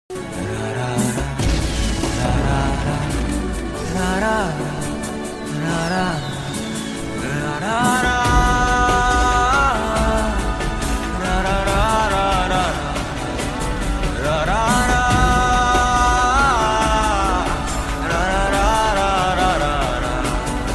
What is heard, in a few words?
love bgm; remo